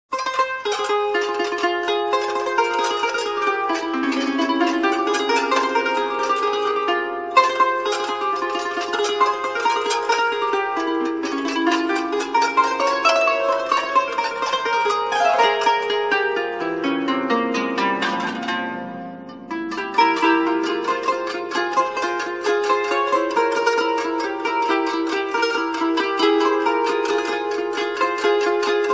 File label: Taqsim